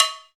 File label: CowBells; Club-50 - Foundations Roland; CowBel+Metl